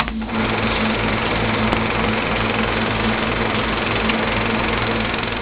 movie projector

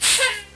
air brake

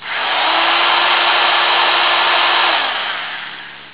electric drill

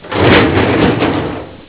trashcan

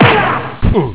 fight

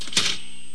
toaster popup

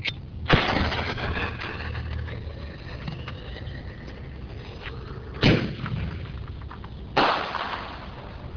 firework launch pop

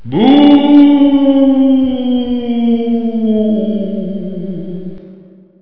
boo echo

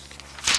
paper turn quick

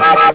toy train horn

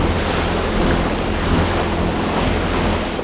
outboard

